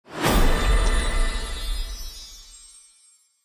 ui talent reward prop

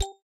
ice wall

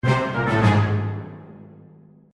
ui battle lose

